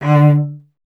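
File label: strings cello D; Miroslav Vitous; Solo Instruments VOL-1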